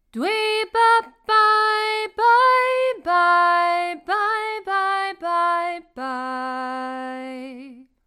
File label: vocals